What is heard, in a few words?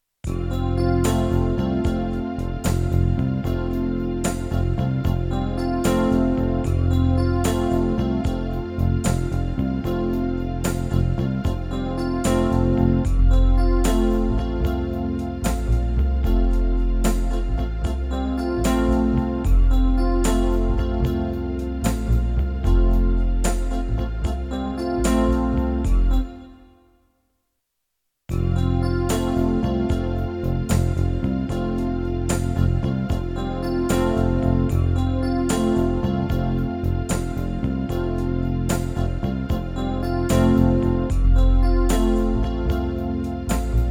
World Old New Bass